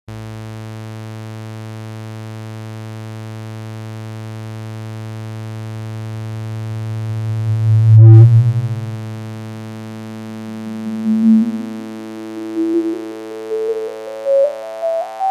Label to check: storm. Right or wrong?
Wrong